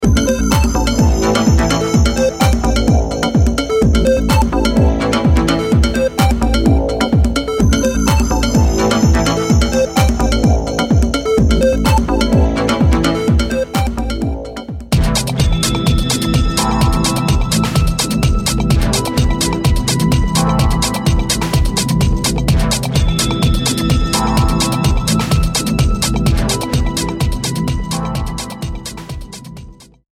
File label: afterhours house